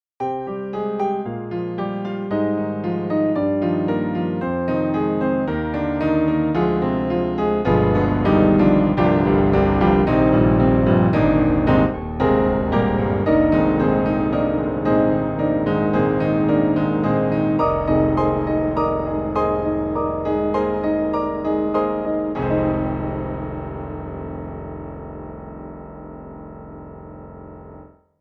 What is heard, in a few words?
Double Piano; Hybrid